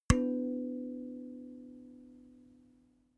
notification kalimba body hit

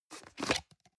plastic can small lid screw open hard

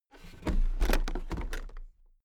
toolbox open regular

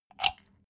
socket pull plug hard

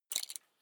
metal belt buckle clatter regular

